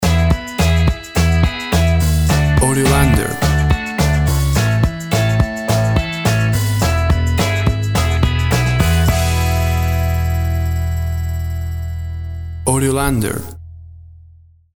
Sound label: Upbeat Sixties Style Pop Rock